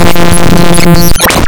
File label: wavetables; waveedit256